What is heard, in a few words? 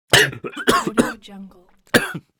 choked